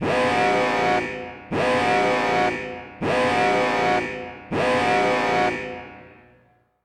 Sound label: ui Klaxon Alert; sc2